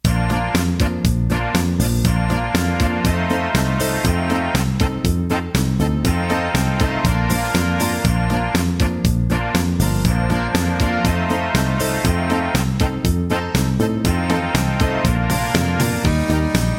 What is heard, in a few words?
Polka